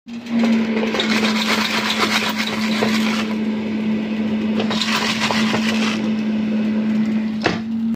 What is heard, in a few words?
air fryer